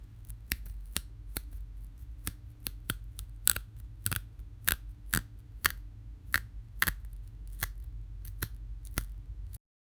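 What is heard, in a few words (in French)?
un ananas se fait découper, fruit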